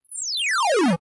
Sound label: menu back